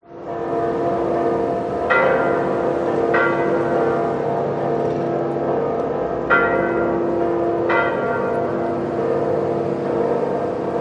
Mexico City; audio hero

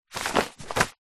paper sheet a3 turn over; zapsplat

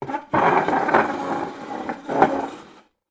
household chair stool drag hard floor; sound effects one

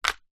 household ice cube down on others in tray

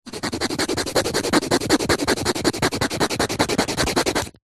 household nail file metal filing nail

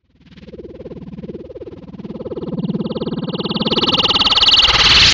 Swell Flutter; bottlerocket

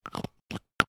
household toothpaste tube lid on; sound effects one

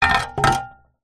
industrial shovel metal set down concrete ground

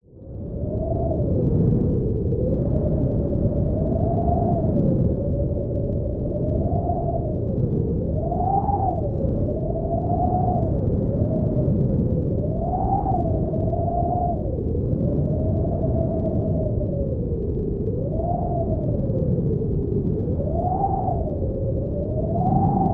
nature wind howling cold stormy; zapsplat

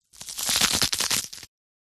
horror bone break slow crush; zapsplat

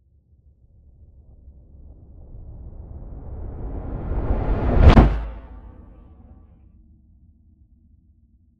Swell; bottlerocket

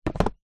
skateboard plastic set down on street; sport

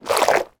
water soapy movement hand; zapsplat

